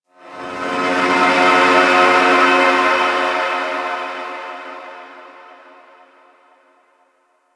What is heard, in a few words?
Random, Ambience Interior; Warframe; Orokin